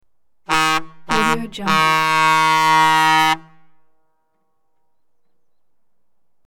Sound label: peterbilt semi truck by with doppler horn; hollywoodedge